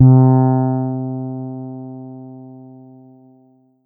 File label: Alesis Fusion Fretless Bass C3